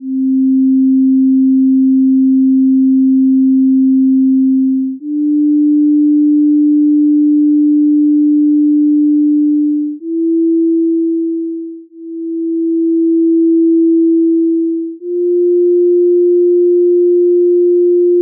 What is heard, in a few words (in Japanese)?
純正律とのビート音階, 基のみ; 付録D：音階・音律